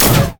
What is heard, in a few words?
rumble shield